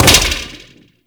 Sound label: Grenade Bounce, Weapons; Warframe; Corpus